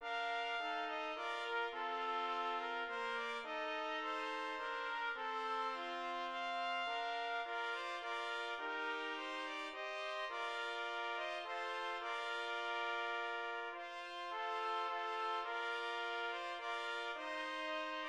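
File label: Great God 2 Trumpet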